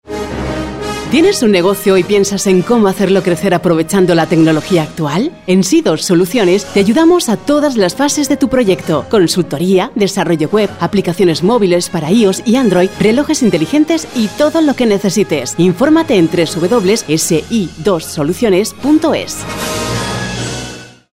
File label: jingle; backtothefuture